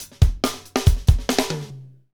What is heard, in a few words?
FUNK; Northstar - Drumscapes Roland